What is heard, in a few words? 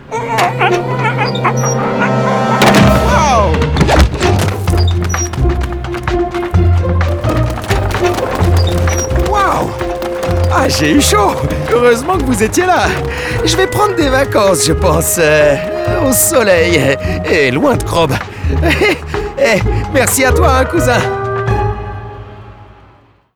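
Outro Victory French; Arthur and the Revenge of Maltazard; Wii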